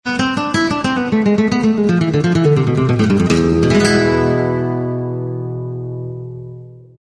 spanish guitar intricate accent

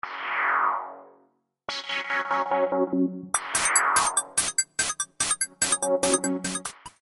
glitch house ringer

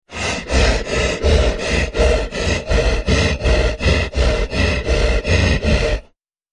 Dating Alarm

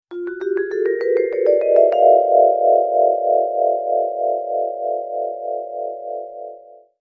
Vibraphone Dreaming Accent

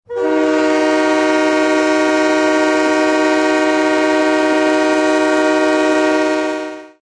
Extreme Loud Horn Alarm

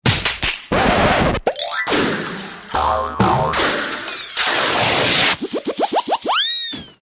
Wild Cartoon Alert

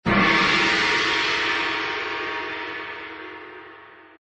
gong big

